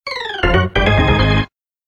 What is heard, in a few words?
Mission Fanfare; mario superstar baseball gamecube ost